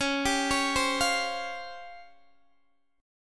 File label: C sharp Major 7th Flat 5th Guitar DADGAD